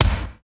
KIK COMBO, Dance Kicks; Roland L-CDX-01

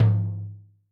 Jazz Flr, Tom, Yamaha DTXpress-II

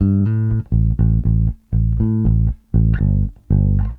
Bass, 70s Analog Funk

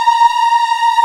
Aahh Chor, VOICE; Best Service Gigapack 1

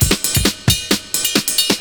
CYMB, Breakbeats; USB Soundscan vol.46 - 70 s Breakbeats; AKAI

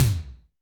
Tom Wet Hex, Alesis D4

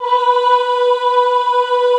AHH B3, Classical Choir; Best Service; Extended Classical Choir

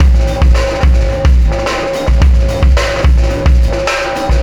System Overload Beat, Processed Drumkits

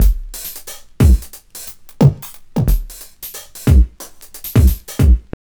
TRIP 90, trip loop

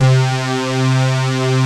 PWM synth; Pro-Rec - Synthsonic; TRINITY

